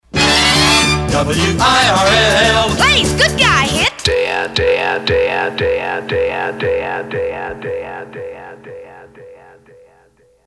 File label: Countdown; WIRL; PAMS